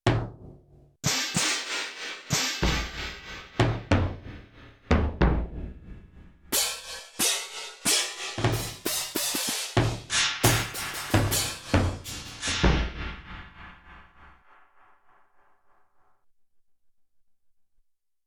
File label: Delay, Cymbals & Bass Drum, Yamaha TX16W